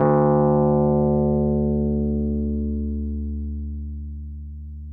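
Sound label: Wurlitzer electric piano; Sonic Reality - Vintage Timetraveler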